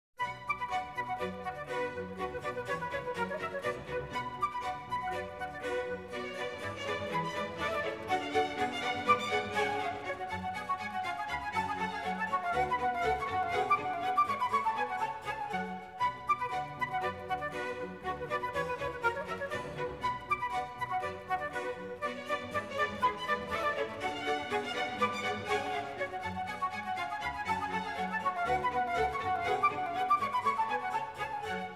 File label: Suite No.2 in B minor, flute and strings; Johann Sebastian Bach; BWV1067; Badinerie